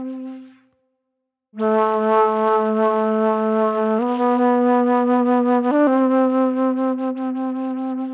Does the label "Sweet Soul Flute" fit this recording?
Yes